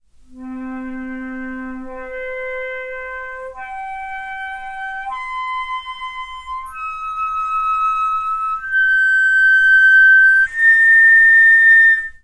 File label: harmonic series c4 7, flute harmonics